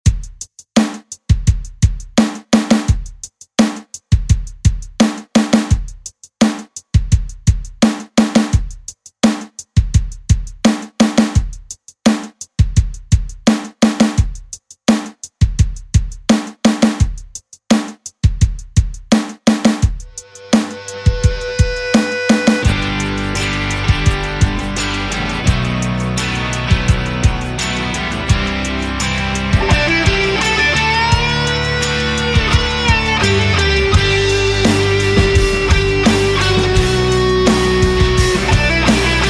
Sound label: Rock Redrum; Deejay Hit